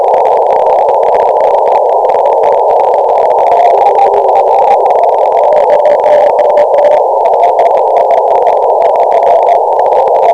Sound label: cw 50 cm dish